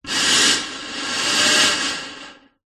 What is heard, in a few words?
human blowing air into plastic tube